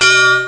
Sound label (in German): glocke; Amiga Soundtracker Sample Packs